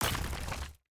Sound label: block destruction stone